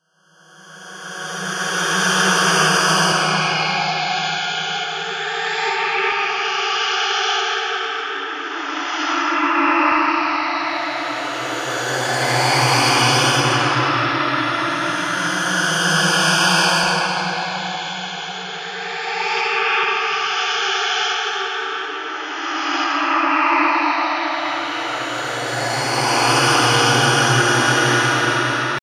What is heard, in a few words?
phantom choir; cirrus